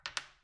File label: domino